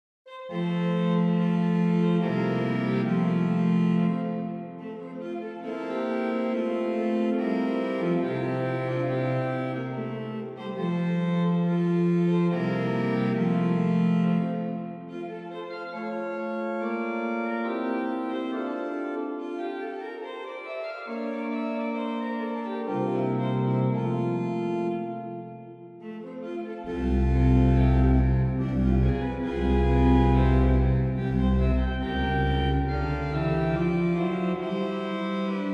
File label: Strophe d hymne, Organ Music; Livre Souvigny